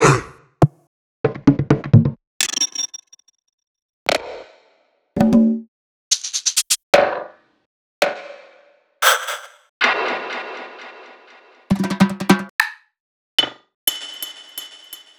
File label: One Shots Perc